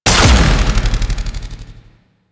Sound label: Short Bow Charged Fire; Warframe; Duviri; Dax; weapons